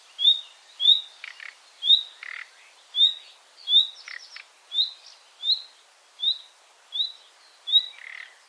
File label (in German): nachtigall